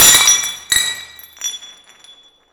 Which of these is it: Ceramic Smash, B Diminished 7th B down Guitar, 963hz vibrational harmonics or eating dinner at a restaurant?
Ceramic Smash